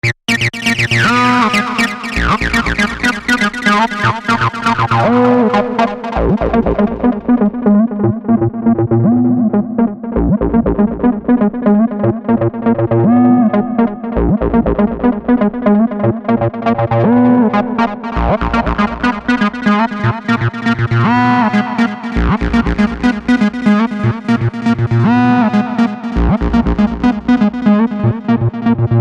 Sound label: 303 acid